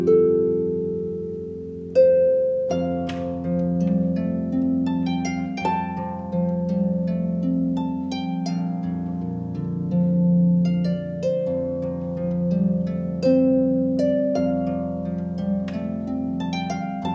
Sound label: harp